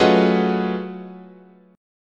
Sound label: F sharp Minor Major 13th, guitar chord